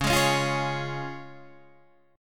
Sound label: C sharp Major, Guitar Standard; strummed; 9,11,11,10,9,9; JGuitar